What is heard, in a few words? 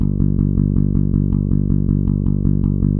bass quavers